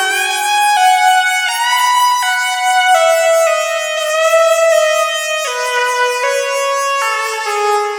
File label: xtra lead; Sonic Foundry (Sony Creative Software); Ugly Remnants VOL-2; Leads